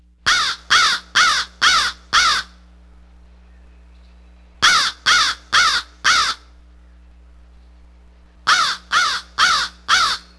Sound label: CROW; Compton's Encyclopedia of American History